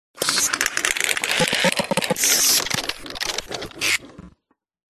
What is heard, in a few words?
science fiction steam punk machine operate clicks speed up slow down; Audio Mangler